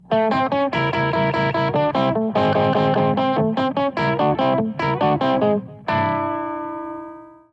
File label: Riff